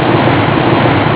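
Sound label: waterfall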